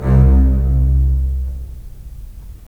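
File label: STR, strings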